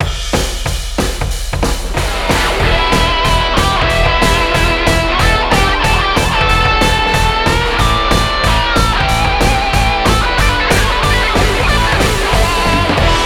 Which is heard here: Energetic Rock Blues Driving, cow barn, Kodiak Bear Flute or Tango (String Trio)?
Energetic Rock Blues Driving